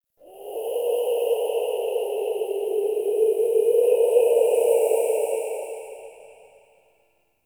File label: Ext Wind Death Howl; Warframe; Infested Eris